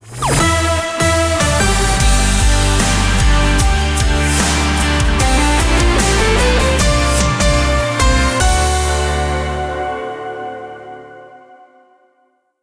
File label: JINGLE; RADIO CHABLAIS; BLACKOUT